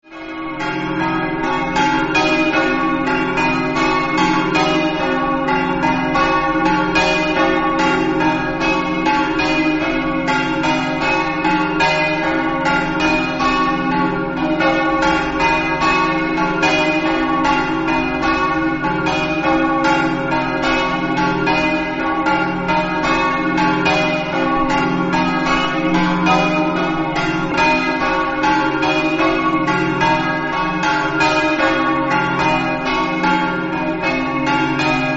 lavariano, campane

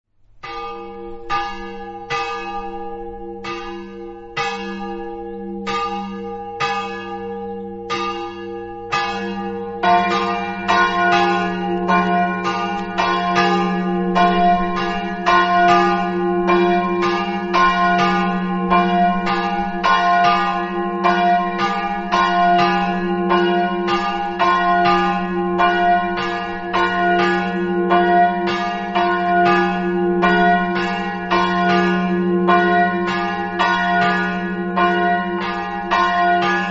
golobrdo, campane

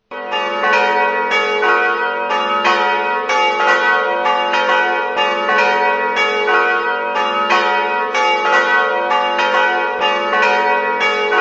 castagnevizza, campane

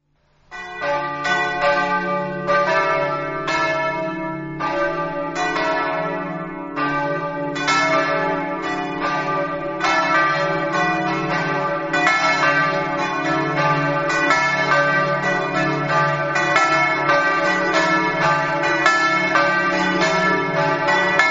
campane, Mereto

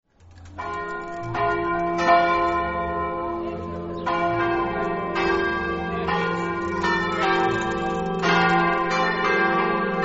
claut, campane

